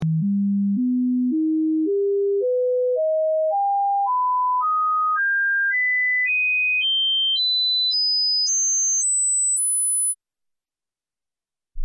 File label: StepSweep; 扫频信号